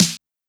Snare; Squid Beatz

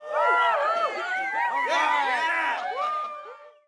Small Cheer; the princess and the frog; Orleans; Music Voodoo

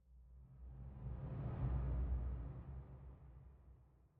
Rhythm Temple Whoosh Upon Approach; Mountain SFX

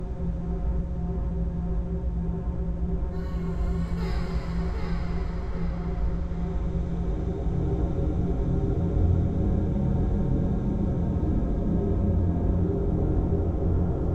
Hospital AMB; Hospital AMB Main; Forget Me Not Annie

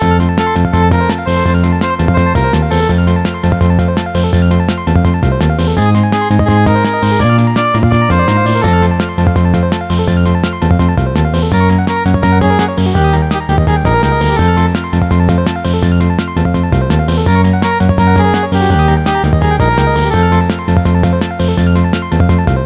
Bonus Game Tune; The Simpsons Hit & Run; ps2

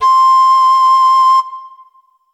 Instrument samples; peppa pig world adventures

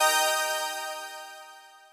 Rush Clear Eb7; Mixolumia

